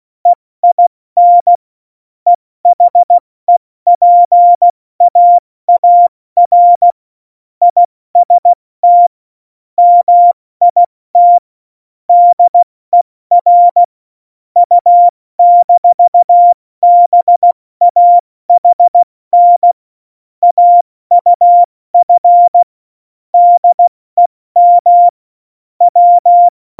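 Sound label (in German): Witz, cw morsen; Morsekurs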